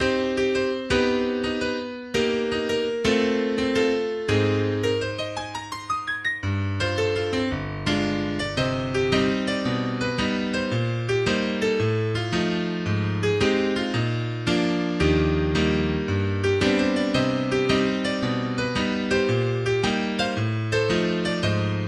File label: A Piano for the Night